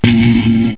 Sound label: Zap; The Ultimate Guide to Mortal Kombat